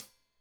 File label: Hat Tight, Hi-Hat, Cymbals; Deeply Sampled Drums